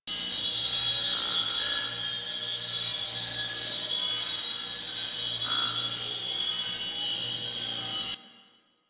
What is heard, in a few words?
PvP Pickup Loop; UI; Pickups; Warframe